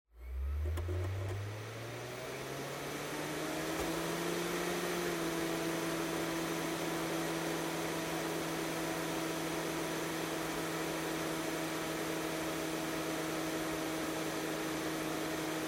Optical Disk Drive Operating Noise

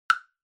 Woodblock, High

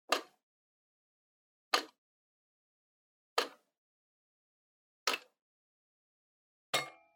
Flip Clock Flip

